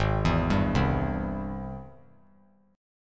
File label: Ab Augmented Major 7th Ab; arpeggiated; 8,11,10,10; Ab+M7; JGuitar